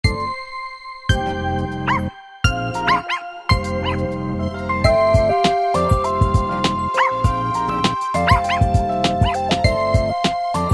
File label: Evening Walk; LG CX265 Rumor2